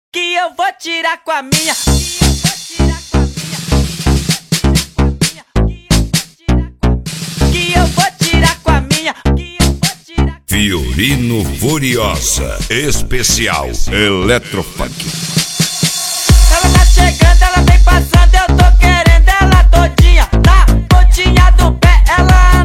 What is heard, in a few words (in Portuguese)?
eletro funk